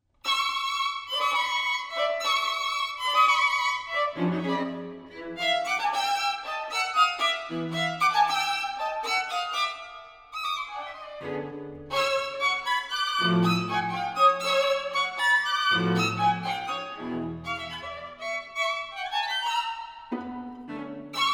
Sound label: String Quartet; Edward Cowie; 'Birdsong Bagatelles'; Song Thrush